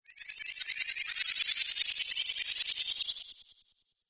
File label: Magic Stardust Whoosh; Andrew Fleming